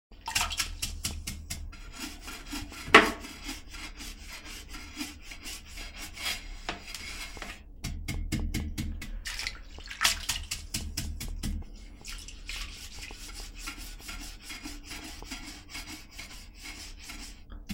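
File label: Toilet brush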